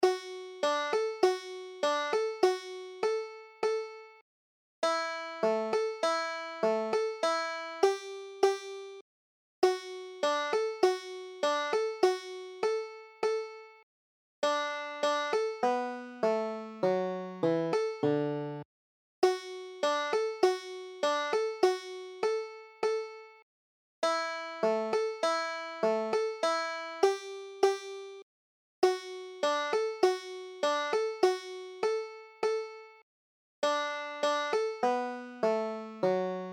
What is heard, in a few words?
banjo